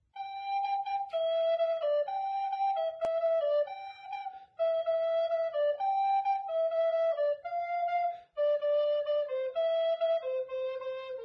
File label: Flute; Instruments; Zomboid; Lifestyle